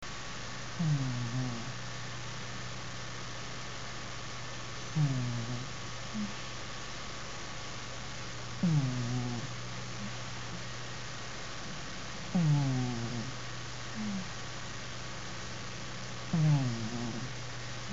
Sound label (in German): katerschnarchen